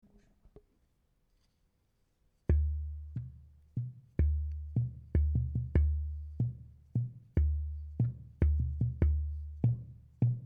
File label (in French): tambour d'eau